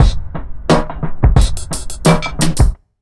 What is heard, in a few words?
88 BEAT, Pure Electro, BEAT MIX; USB Soundscan vol.03; AKAI